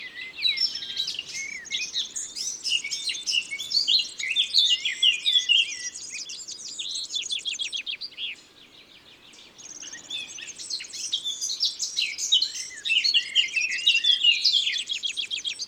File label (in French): Fauvette a tete noire; Concerts naturels de l Isere; Studio Les Trois Becs